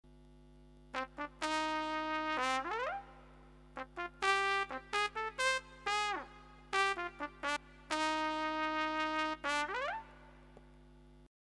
Pa3X LE Jazz Trumpet

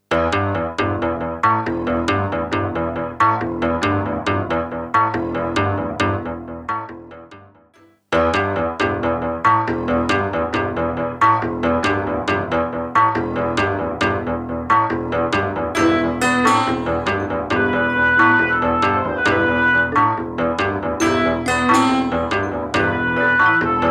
Lexicon Model 200 Plate Perc Synth

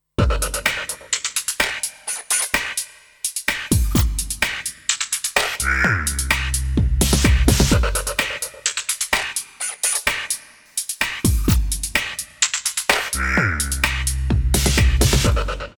tempest flanger plate; DRP-20

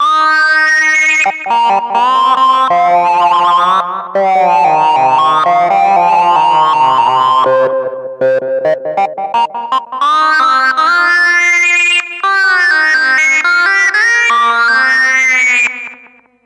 Sync Lead, tc electronic Flashback X4

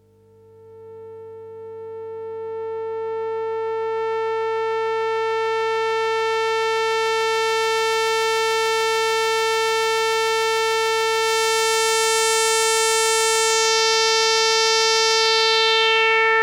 sq 12db filter; Sonicsmiths

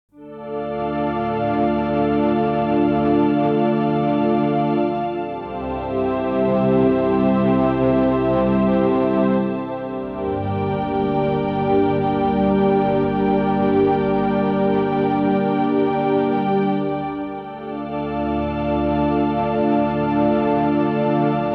Ambient Sound

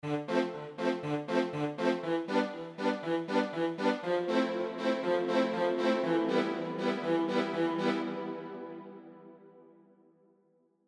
Lexicon Hall Strings

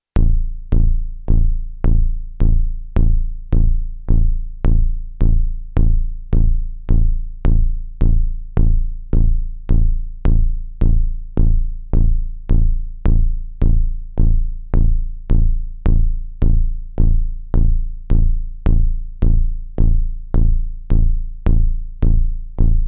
Volca Kick lowest max drive; 1dB Laut

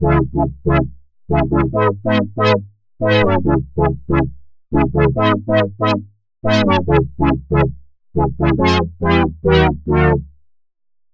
Techno Strings Chord Filter; TAL-Pha

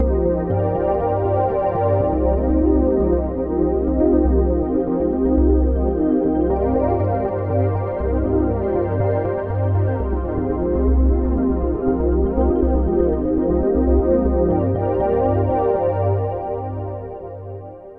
Quartet Chorus Ensemble Chorus; Reason Studios Reason 11

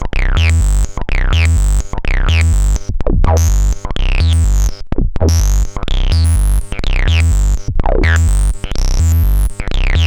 Liquid Foam Loop; HerbsAndStones

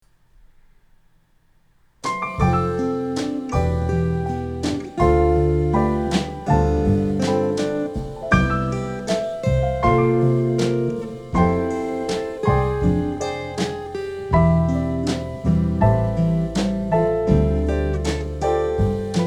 Pianobar Neumann KM140

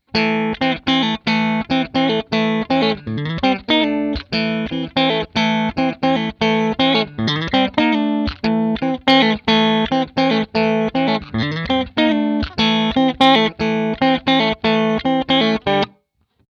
Clean Rhythm Pickup pos.4

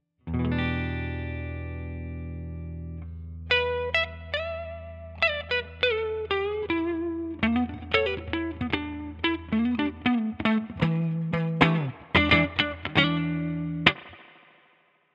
Fender Reverb Deluxe

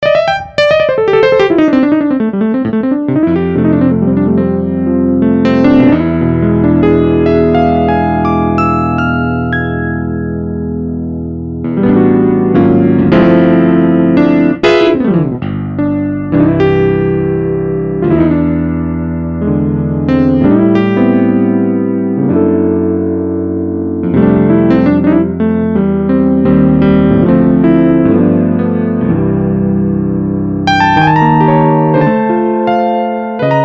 Jazz; by Benoit Henriot

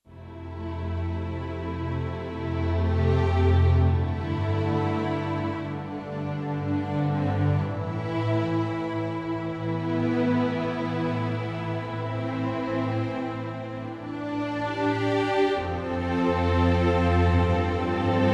Ensemble Strings; Chris Hein

